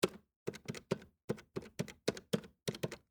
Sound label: Telephone Push, Technology, Telephone, Push, Button, Dial, Number; Long, Distance; BlastWave